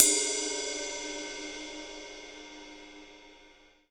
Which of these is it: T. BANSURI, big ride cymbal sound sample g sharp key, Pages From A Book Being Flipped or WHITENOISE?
big ride cymbal sound sample g sharp key